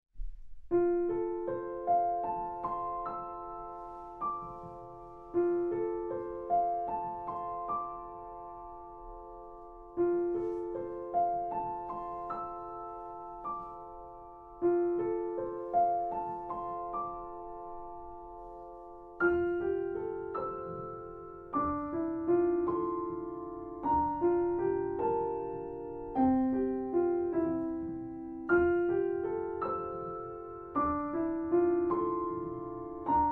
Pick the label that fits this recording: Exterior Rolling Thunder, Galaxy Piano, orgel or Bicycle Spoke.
Galaxy Piano